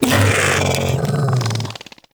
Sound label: Bug Vocal Death; Characters; Warframe; Hunting Critters; InfKDriveBug